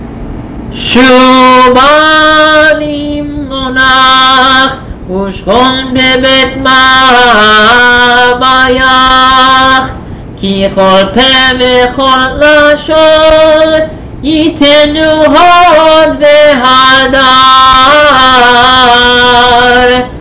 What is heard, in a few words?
Shubah with Solo Ubnuho for Summer no choir; Shabbat Morn